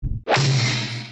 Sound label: slam; hanna barbera